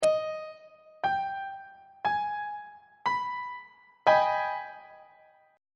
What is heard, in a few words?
g sharp minor major 7th 2nd inversion on treble clef